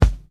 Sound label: mid range steel kick drum sound F sharp key